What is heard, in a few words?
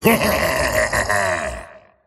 laugh; tiny